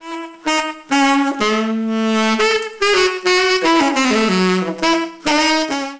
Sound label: sax